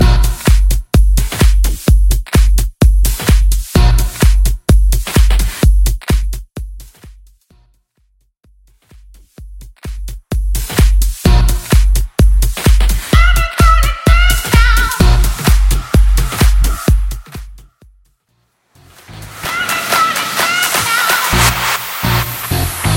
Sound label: 90s House